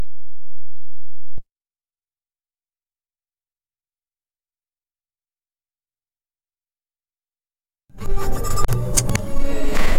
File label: confirm menu scroll menu, menu